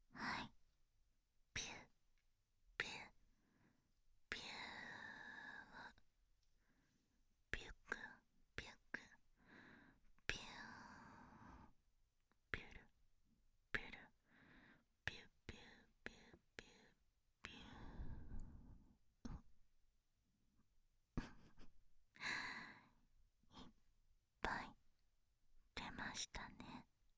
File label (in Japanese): びゅっびゅー